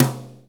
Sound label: TOM, Drum Dry; East Collexion; Drum 1